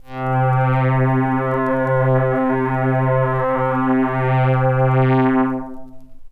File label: DX100 Cold Strings Vinyl Synths C2; vinyl keys